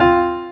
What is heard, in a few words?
Drop Lead; osu